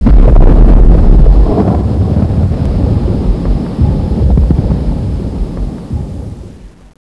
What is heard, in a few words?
dog rumbling